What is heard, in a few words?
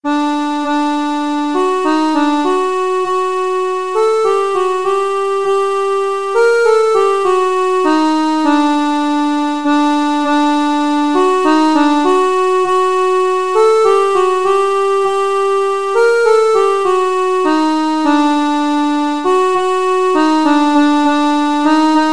hava nagila, accordion